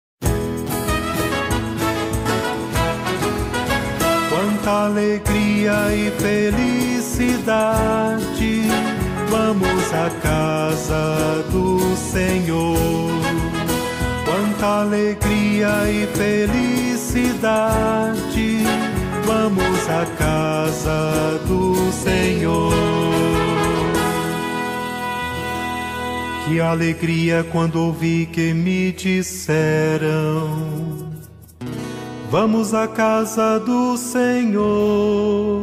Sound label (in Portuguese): salmo com contralto